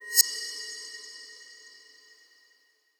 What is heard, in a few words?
Sweep; 7rystan's 600+ FREE EDM SAMPLES & DRUMS; SFX